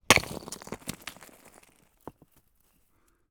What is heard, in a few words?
rock impact medium; Alexander Kopeikin; Rocks